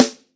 snare single hit b key; drum single hits one shots; snare drum samples

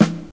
rich lows steel snare drum sound e key

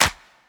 clap D key; percussion; single hits one shots

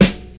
classic studio hip hop snare one shot f sharp key

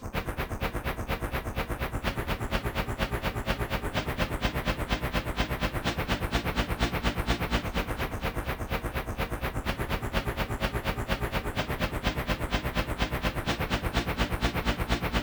rave sequence - A sharp - 126; hardcore

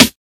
sparkle acoustic snare b key

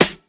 natural snare drum sound g key

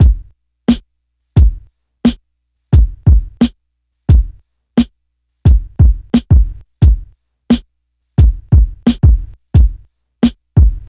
88 bpm breakbeat A key; drum loop

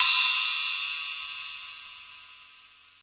long tail reverb crash cymbal f key

